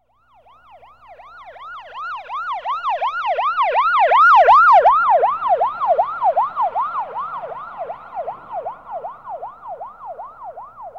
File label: Police Siren Coming G, horns sirens